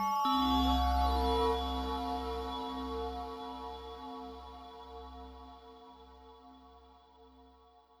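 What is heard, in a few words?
Heavenly Ambience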